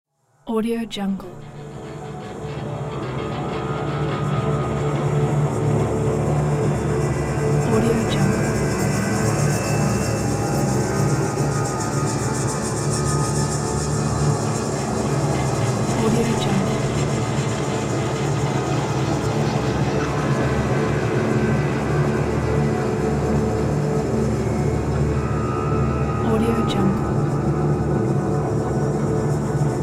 game background ambience; phillipmariani

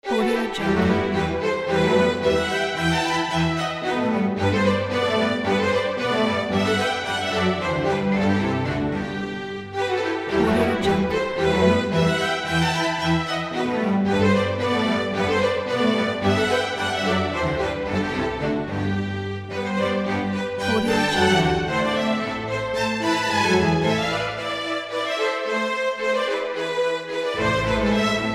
symphony for strings in e minor; antonio vivaldi; iii allegro

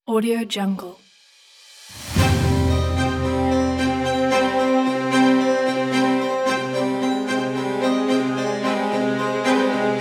dark orchestral intro

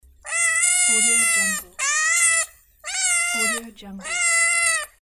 cat; erhanbicici

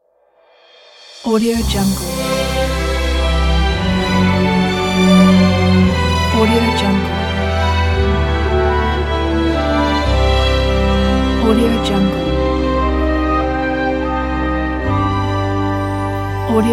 orchestral prologue ident

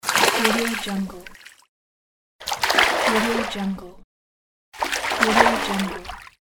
fish splash

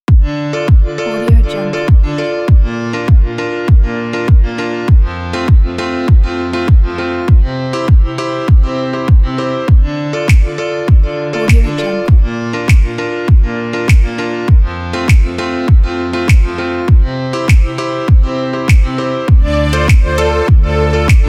pop electronic sport pack

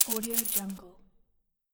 coins

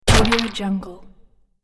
golem or stone troll hit; sonic-boom

